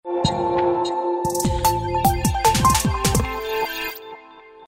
Beat edition Power On; Samsung; GT-M7600 BeatDJ; Power on-off tones

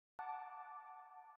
Food Bell; Motorola; Moto E20; audioLenovo

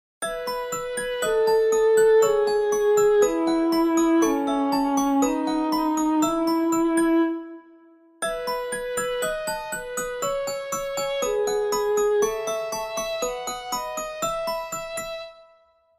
Tweedle, ringtones; Lenovo; S660

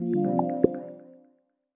presence offline

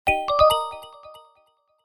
Crisp Tone, notifications; Tecno HiOS 16

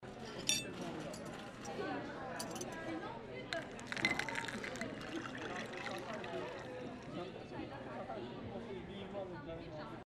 manner restaurant, ringtones; Samsung; Galaxy Tab SHW-M180S

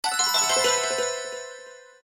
Japanese close; Nokia; audio themes

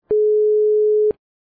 ringtone, Samsung SGH-i620

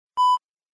Beep once, ringtones; i-mobile i-style Q1

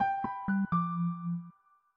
Peaceful Piano; Samsung Galaxy S26 Ultra; Calm

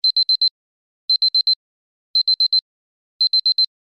Beeping, alarms; Infinix; XOS 10

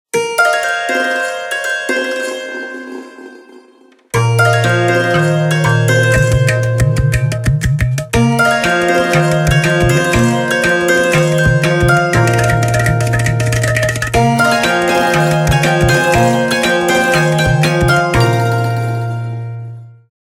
Santoor Ring; Nokia; Lumia 830 India

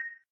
Video Record End, ui; Meitu; V7

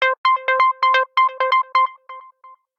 Spaceship, Ringtones; Samsung; Gear S

